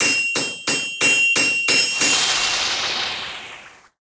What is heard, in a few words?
forge